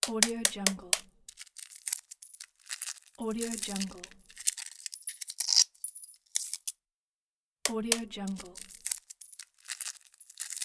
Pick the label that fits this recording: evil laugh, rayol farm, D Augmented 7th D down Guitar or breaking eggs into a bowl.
breaking eggs into a bowl